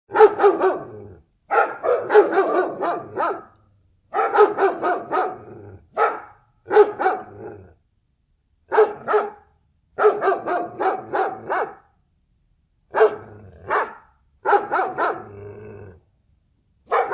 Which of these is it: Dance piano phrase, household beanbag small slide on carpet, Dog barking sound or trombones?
Dog barking sound